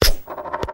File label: turtloid die; Clicker Heroes